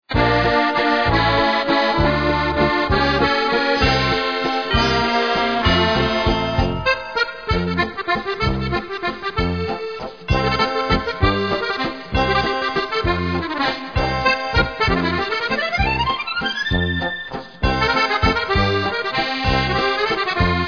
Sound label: Carillon D Alsace